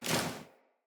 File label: Generic Weapon Aim Transition Out